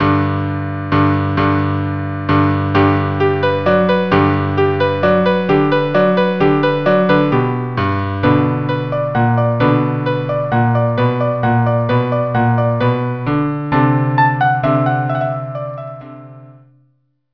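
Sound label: Dance violin cello; Grieg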